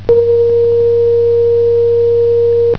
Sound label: TUUT